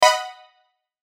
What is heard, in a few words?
chime burst; Multimedia; Chimes; Blastwave